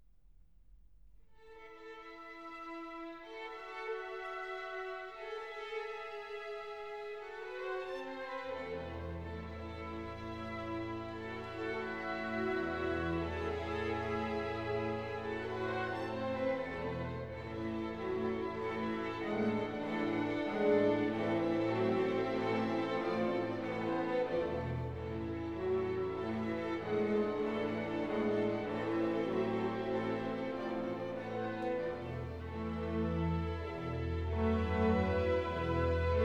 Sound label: string orchestra